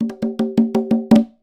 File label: Fill, Conga; percussion; musicradar